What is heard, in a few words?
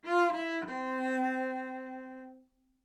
live string phrases, string phrase Cmaj 100bpm